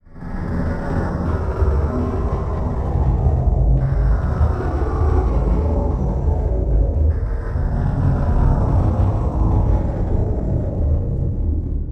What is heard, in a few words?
Production Element Title Transition Demonic